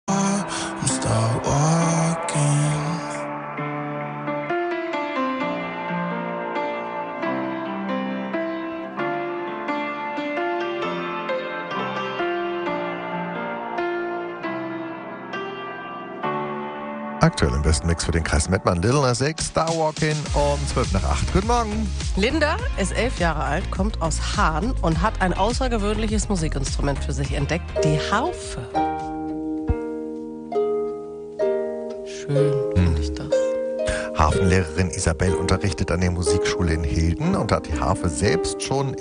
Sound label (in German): harfe; aussergewoehnliche hobbies harfe spielen